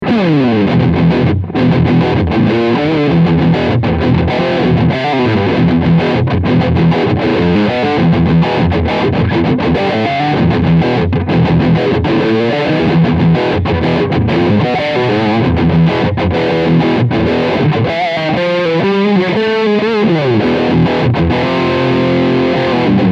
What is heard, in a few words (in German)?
Steg PU Distortion Riff